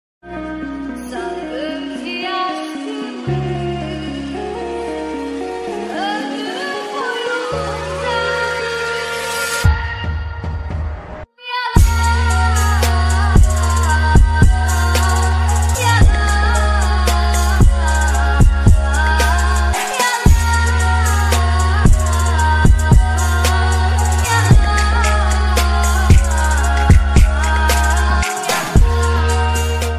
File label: ya laaaa aaaa sad ringtone